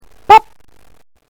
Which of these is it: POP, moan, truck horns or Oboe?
POP